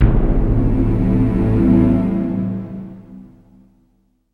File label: Opening (Stinger)